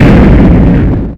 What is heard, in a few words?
dynamite exp; weapon; wormux